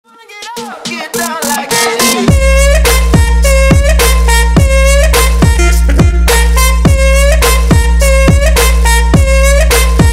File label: moombahton